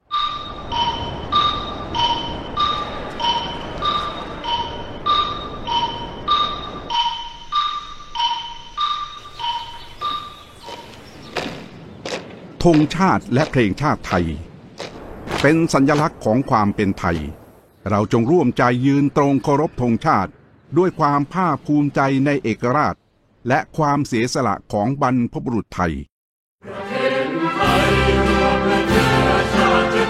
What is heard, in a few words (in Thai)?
เพลงชาติไทย พร้อมเนื้อร้อง, National Anthem of Thailand